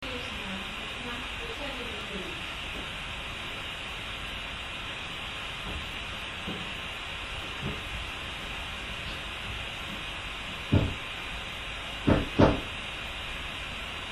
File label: bangs